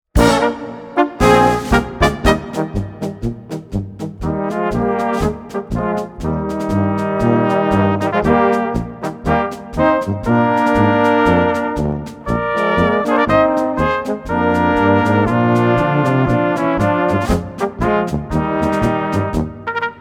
Polka; Allgaeu

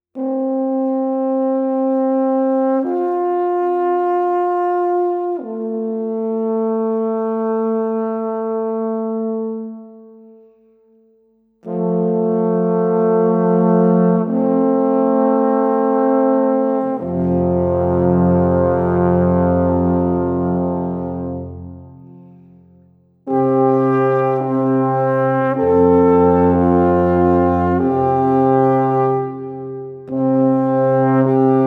Alphorn Serenade

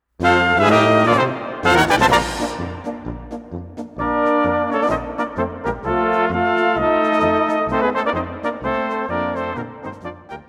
Polka

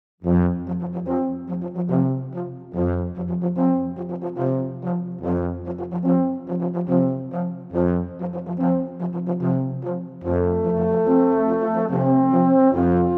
Alphorn Bolero

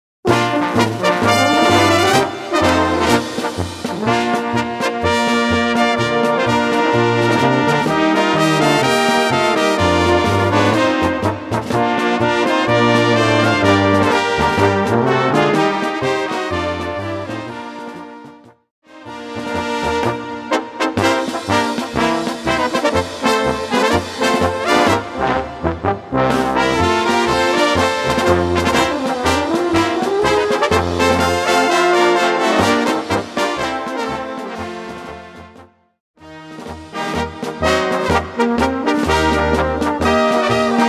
Marsch

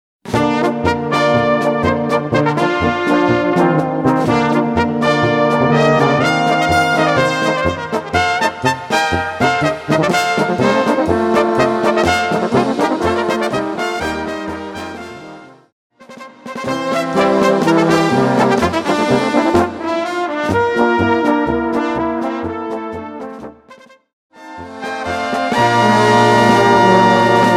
Polka

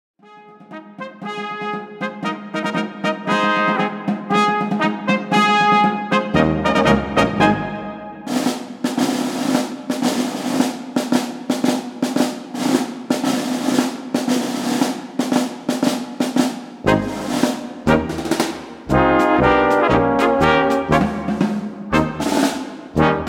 Trommel Parade

